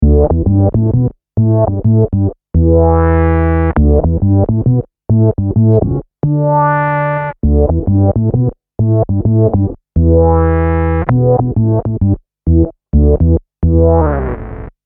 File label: EHX MS Sub Oct Trigger Filter